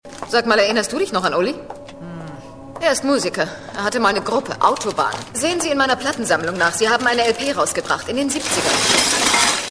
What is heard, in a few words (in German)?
autobahn